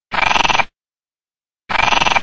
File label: bird